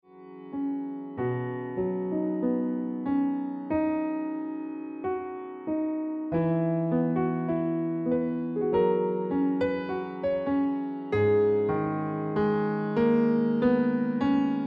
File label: Ringtone Piano Music